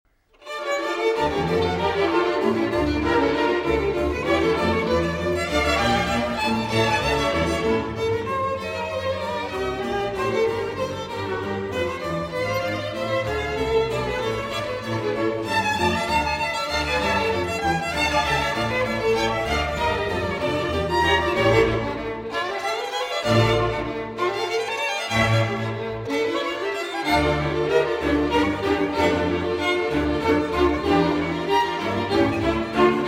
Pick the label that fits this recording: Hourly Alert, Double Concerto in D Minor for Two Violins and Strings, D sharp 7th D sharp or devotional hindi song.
Double Concerto in D Minor for Two Violins and Strings